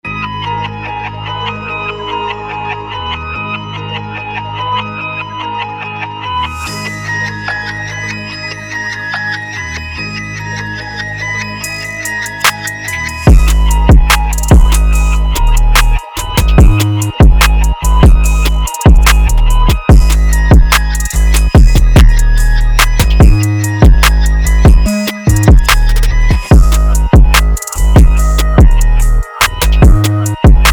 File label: Trap Drum Kit